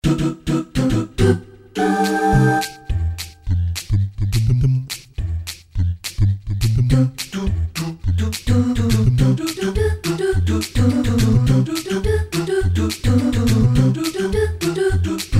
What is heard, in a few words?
warp zone music; nintendo